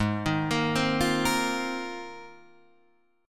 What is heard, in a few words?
Ab 6th Add 9th Ab, Guitar, Open D